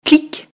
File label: clic